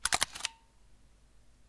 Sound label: shutter release sound